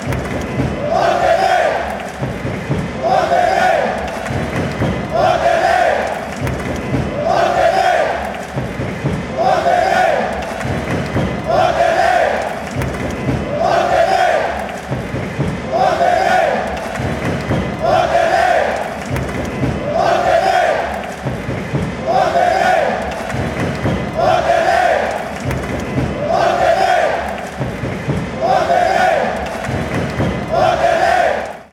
bateria, fan chants; monterrey

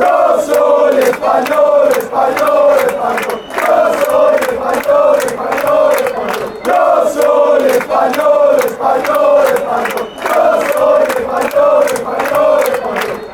spanish, chants

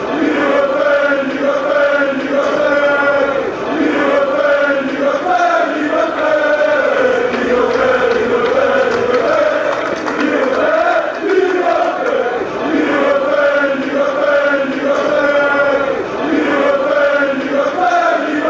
chant, fanchants; feyenoord

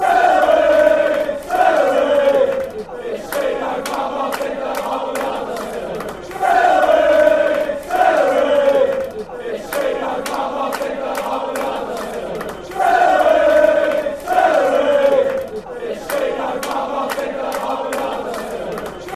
celery celery, chants; gfc

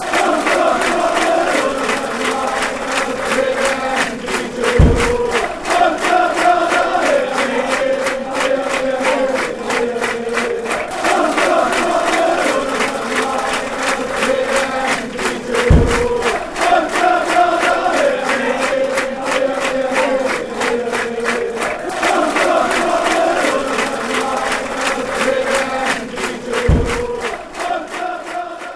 come on, chants; viola

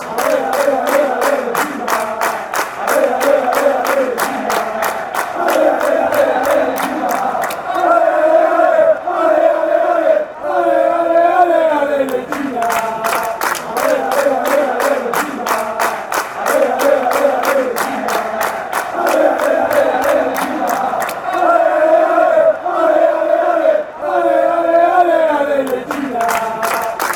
ale ale ale, fan chants

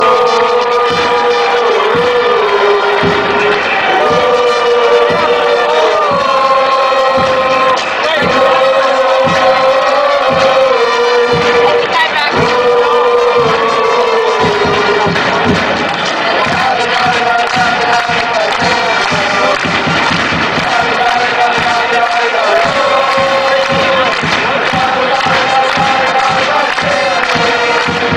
dale, chants; cavese

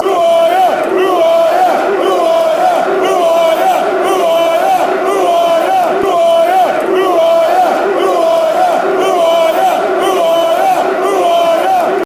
who are ya, fan chants; itfc; Ipswich

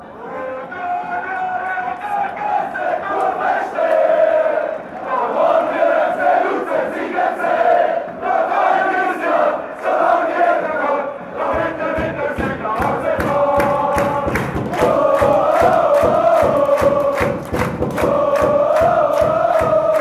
oh oh oh ah oh, chants

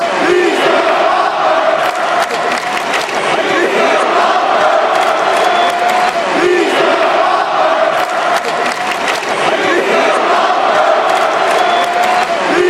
hes flopper fan chants

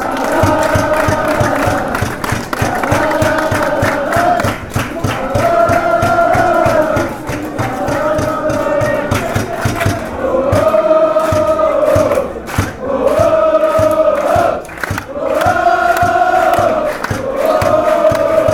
lalalala woahhhh

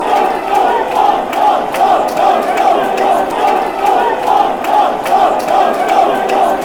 off, chants; swansea

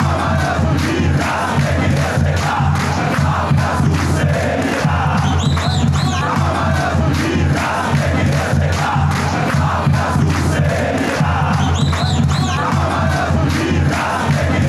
fan chants; maior de minas

